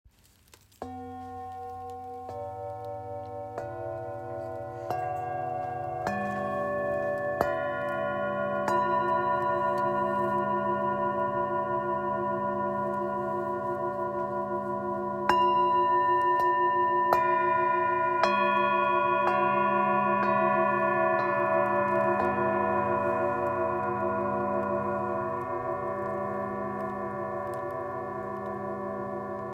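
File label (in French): Set, bols chantants tibétains; sensoriel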